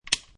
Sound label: Office Lamp Switch On, Switch click; Switch click as offfice lap switch on; Lamps (Sound Effects:Household)